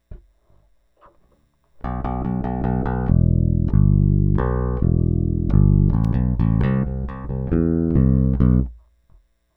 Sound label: bass